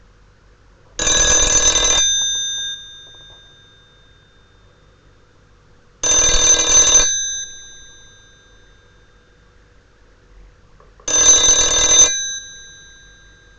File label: ring; Opis-60